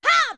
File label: Attack; Link's attack shouts (rolling or sword-slashing)